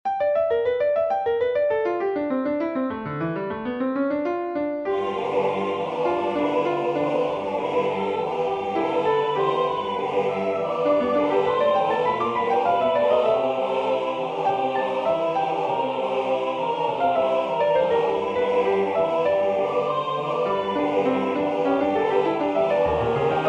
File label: vocalise satb pno